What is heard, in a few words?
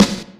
snare 1; virtual drum sounds; double pedal